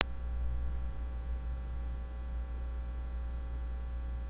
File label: laugh